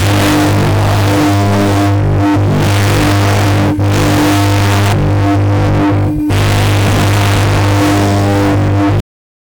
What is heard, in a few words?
group of people are shock, shock